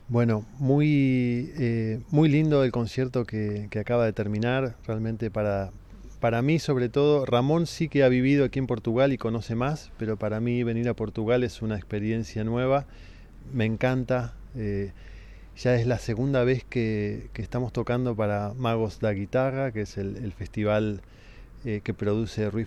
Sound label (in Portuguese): tango em guitarra; Uma viagem à música popular de referência do Rio da Prata, aos estilos do tango argentinos; centro cultural de macedo de cavaleiros